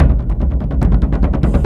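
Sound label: Music Shield; From Dust; PS3; Xbox 360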